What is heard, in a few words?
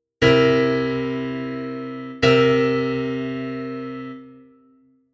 c7 jazz